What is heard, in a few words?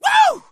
woo